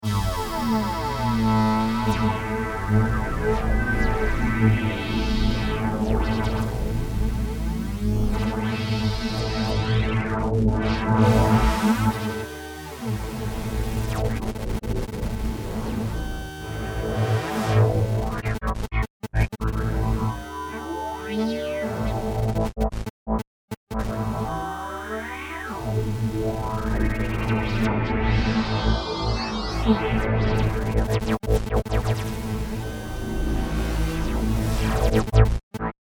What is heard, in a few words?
Multi Granular